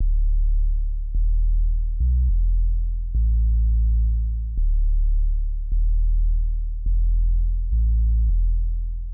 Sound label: Cmaj 105BPM Bass; 99Sounds; Monosounds; Music Loops; PANDORA BOX!